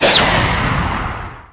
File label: Folder Opened; Voiceover Sounds; Angry Birds Sounds